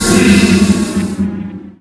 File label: Beam Off; Phoenix